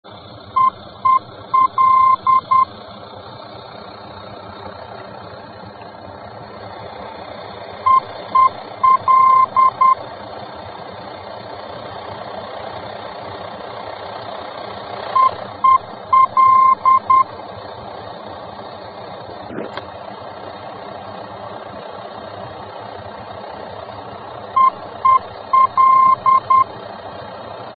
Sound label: VOR, aerobeacons; netherlands